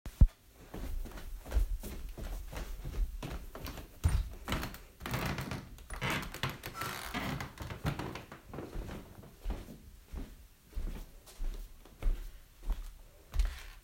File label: Stairs